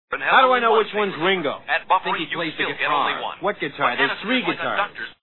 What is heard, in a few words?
guitars